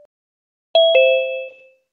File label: new call